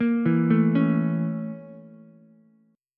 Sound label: A sharp Minor Major 7th Flat 5th A sharp Ukulele; A#mM7b5 arpeggiated; JGuitar